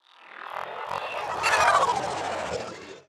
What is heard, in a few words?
Movement; 99Sounds; InterSpace